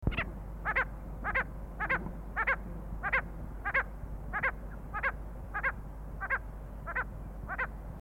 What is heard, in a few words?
coracias naevius, birds calls; coraciidae